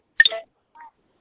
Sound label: Beep